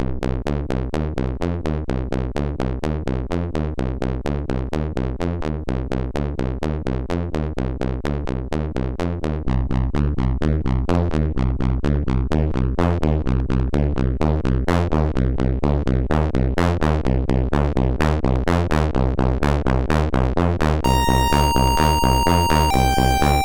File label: MOS 6581R3; Blues Brothers